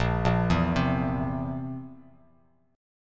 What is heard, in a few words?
A sharp Suspended 2nd Flat 5th A sharp, Bass Standard; arpeggiated; A#sus2b5; JGuitar